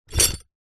animals horse saddle set down ground; zapsplat

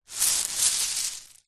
small stones scoop up by hand; foley; zapsplat

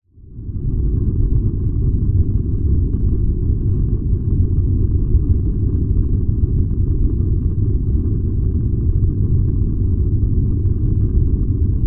household furnace burn roar; zapsplat

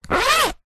plastic document wallet zipper; office

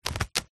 tent fly sheet velcro undo; foley; zapsplat

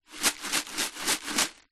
fuse beads in plastic tub movement shake

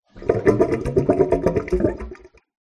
sink plug gurgle from bath draining backwash pipe; household

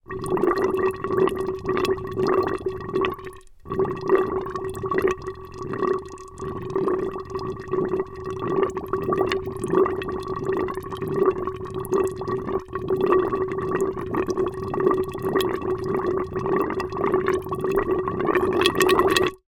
water gurgle dregs air pipe long

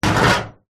foley metal scrape on metal panel

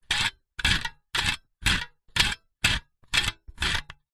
office staple gun metal dial turns multiple

